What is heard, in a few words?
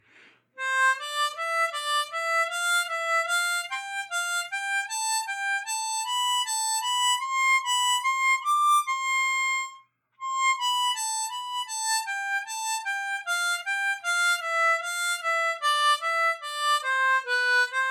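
C Major Triplets Ascending Descending, harmonica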